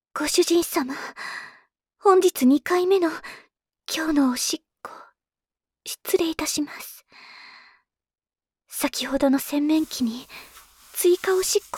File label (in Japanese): 洗面器に追加おしっこ